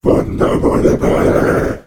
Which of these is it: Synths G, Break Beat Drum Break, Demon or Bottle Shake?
Demon